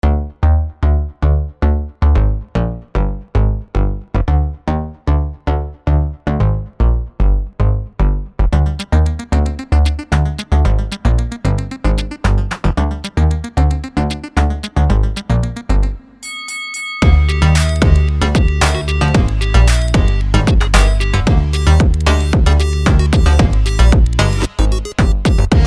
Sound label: Remix inst; FNF